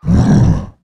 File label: attack, male zombie; nmrih; zerosiki; slender